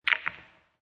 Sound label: Billiard Pool Snooker Ball Hits Several Other Balls; Pool ball, snooker ball, billiards ball: Ball hits several other balls